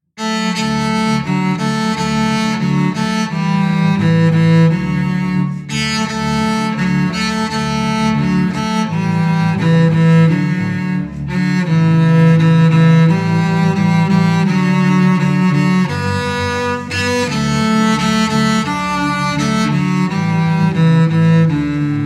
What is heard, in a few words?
no bass bar, violins